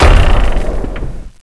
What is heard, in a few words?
explode